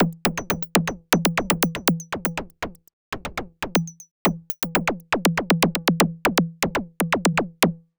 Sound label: Subtractive Beat